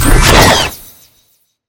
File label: pillow cast; familiar